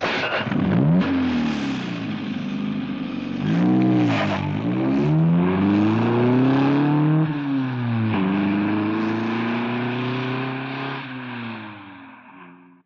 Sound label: car start